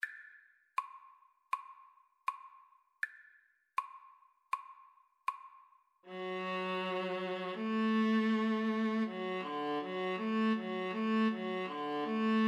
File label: Viola Duet